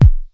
tight kick, Klone Dual Percussion Synthesiser drumhits mono